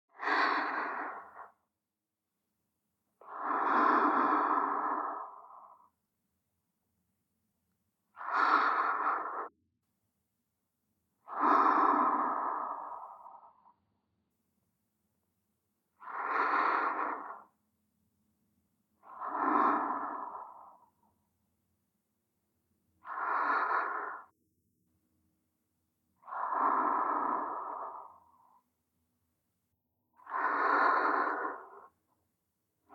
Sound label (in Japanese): 友達みたいな彼女の寝息 (右) (ループ); SEあり